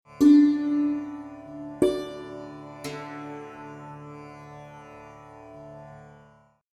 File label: Swaras Sa Drone; ragas; ragajunglism